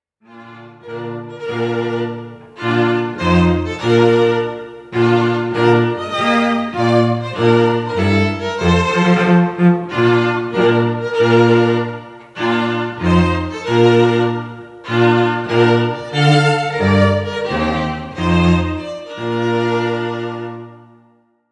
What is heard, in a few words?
bridal march